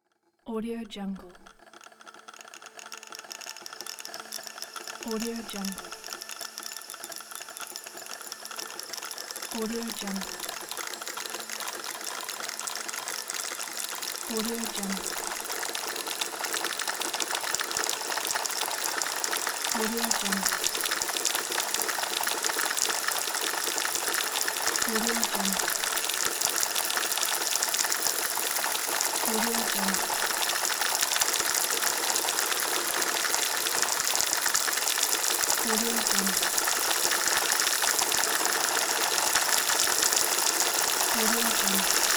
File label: futuristic rain atmosphere